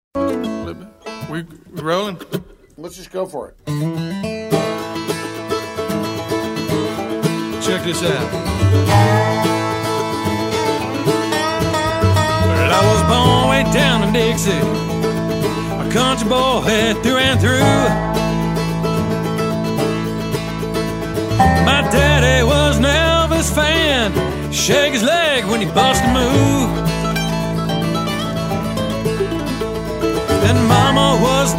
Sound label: boogieman acoustic